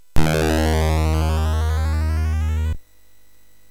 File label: Final Synth Sample, MOS6581R3; hvsc